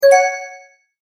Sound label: multimedia tone start; Sound Design